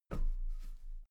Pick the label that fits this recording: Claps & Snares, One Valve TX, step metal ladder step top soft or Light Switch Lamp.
step metal ladder step top soft